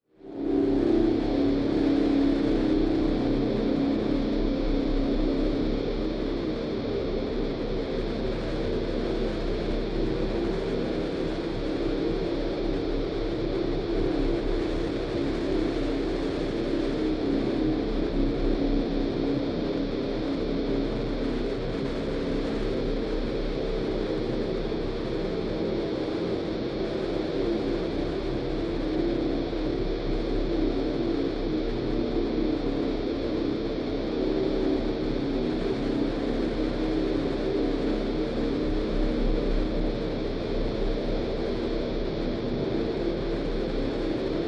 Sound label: Drone Static Distortion; Production Element Imaging Element Texture Bed Atmosphere Synth; 5.1 STEREO MIX; Blastwave